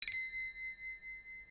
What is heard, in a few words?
Jingle